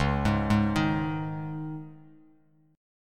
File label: Db Suspended 2nd, Bass, Dbsus2 chord; arpeggiated; JGuitar